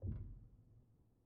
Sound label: Pedal On, Upright Piano, Knight; Chordophones